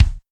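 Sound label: R70 bass drum Dry Ac K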